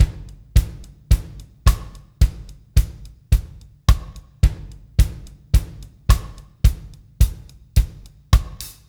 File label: PULL STIK, Backbeat, PULL UP; Spectrasonics